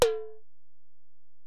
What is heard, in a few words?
Tom, Alesis DM-PRO